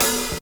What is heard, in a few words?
dampened open high hat sound a key